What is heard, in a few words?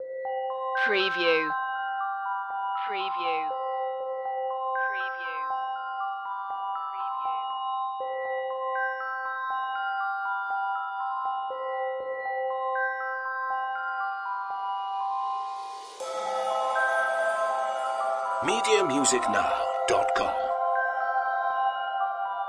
fairy dream magic less bells